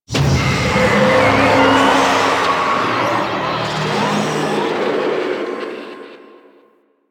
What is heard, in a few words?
Zoo Wave Pain Stinger; Warframe; NineteenNinetyNine; Stage10TheZoo